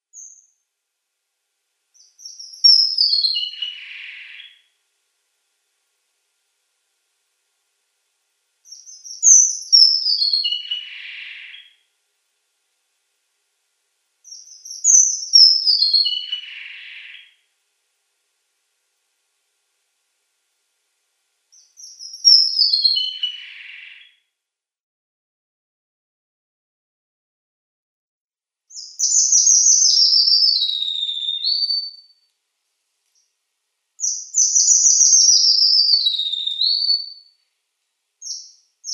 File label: Red Avadavat song